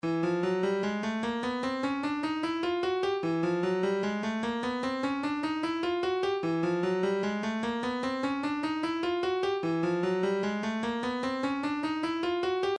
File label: 150 Hihat, midi